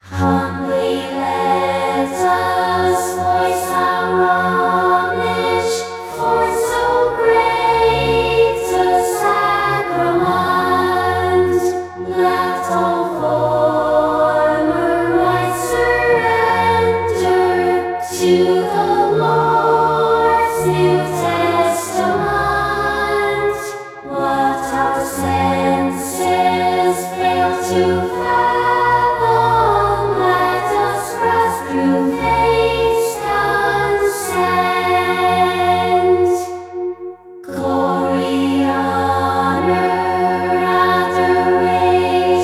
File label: choir